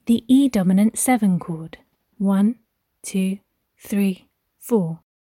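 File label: E dominant 7th chord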